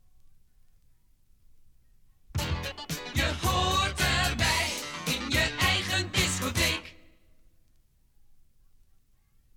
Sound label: topformat maxi jingle single